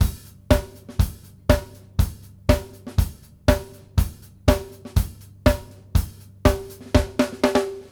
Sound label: HOUSE BEAT, 120; Sampleheads; New York City Drumworks VOL-1